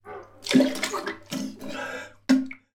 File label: human man vomiting in small bathroom